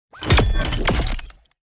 Sci-fi gadget gizmo cybernetic

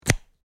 Electrical plug insert click plug in

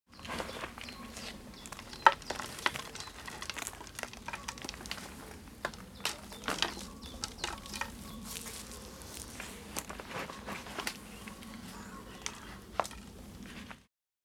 Potting plants nature garden ambience

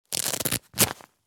Bulletproof vest straps velcro fasten; military equipment sound effects

